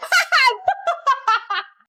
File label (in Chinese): 哈哈哈